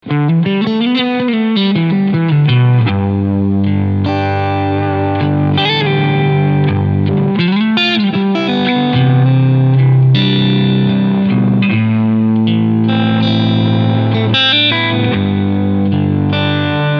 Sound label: US Model 65 Full Gain Full Limiter; Quilter Superblock